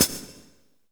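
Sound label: hi-hat, Real Mega Drums; Best Service; Real Mega Drums VOL-1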